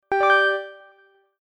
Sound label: kiran